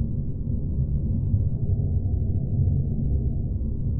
Noise Loop, sparse soundscape

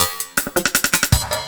LOOP SD, Drum & Bass, 160 BPM

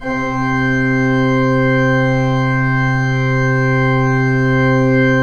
PIPE, 3DOrgans

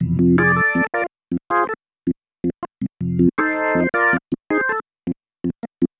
Progression, Organ, Loops

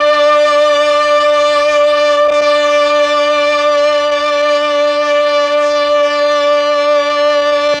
MEL STR, Legendary M400; ILIO; Propeller Island

